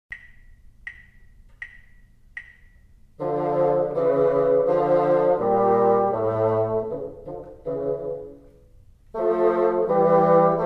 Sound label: together, bassoon